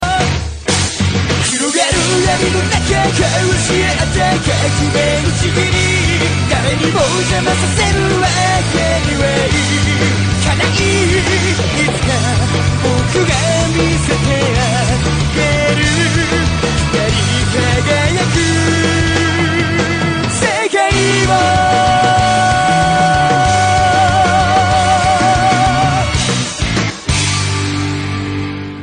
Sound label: Evil; Death Note